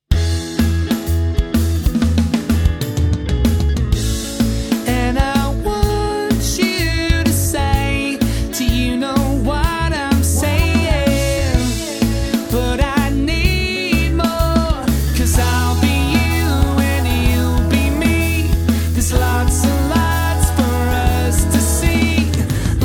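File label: party wedding band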